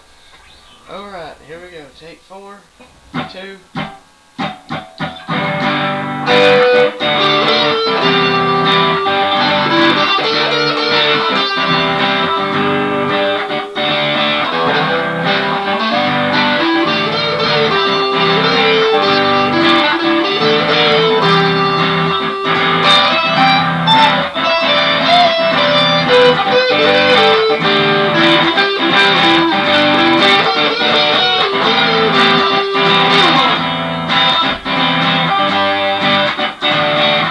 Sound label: jam